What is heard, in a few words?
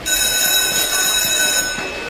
school bell big